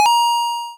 item get

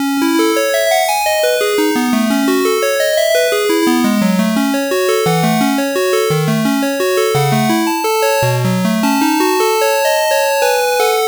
bgm classic debussy arabesk

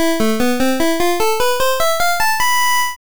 jingle koto

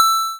item get

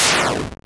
hit

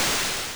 hit

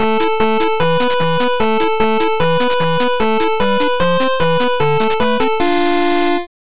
jingle classic douyou sakura sakura

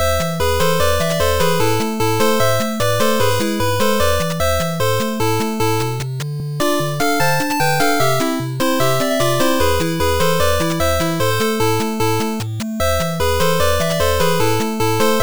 bgm classic korobushka

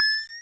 power up

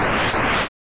jingle encount

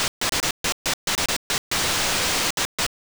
jingle army

